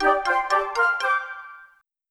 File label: Jingle Get Item; Shiren the Wanderer The Mystery Dungeon of Serpentcoil Island Switch gamerip 2024